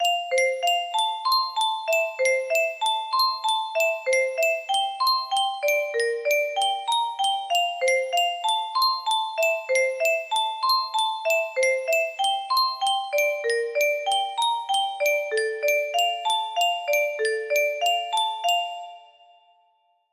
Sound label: music box, melody